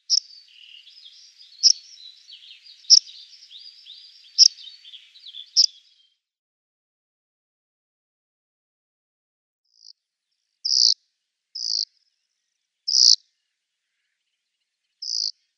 Corn Bunting calls

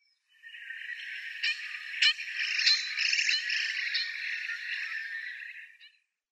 Yellow-headed Blackbird calls

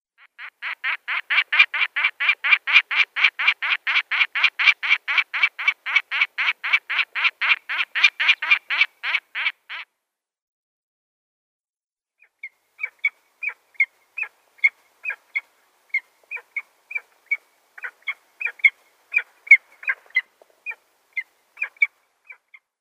Barbary Falcon

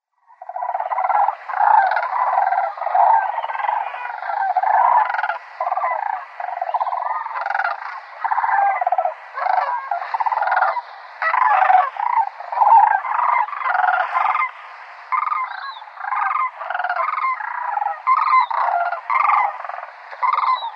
Sandhill Crane

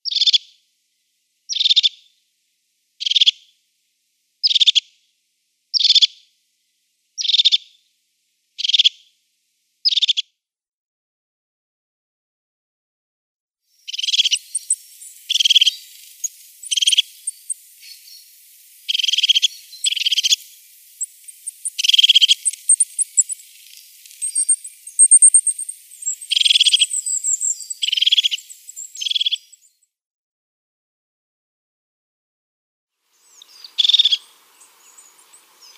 Crested Tit calls

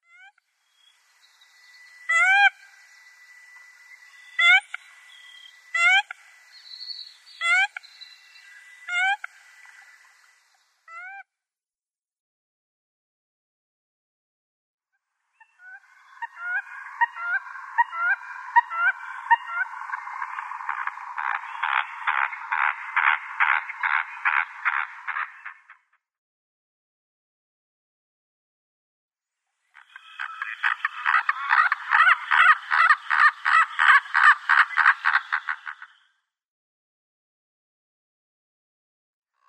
American Coot

